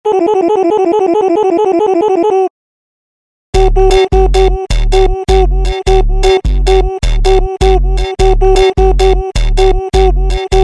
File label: Sombra Boop Funk super Slowed